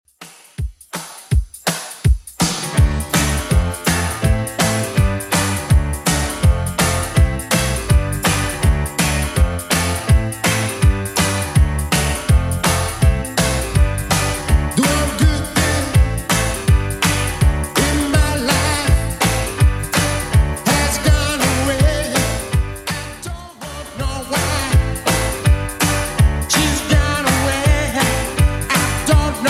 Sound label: 80s 164bpm; Redrum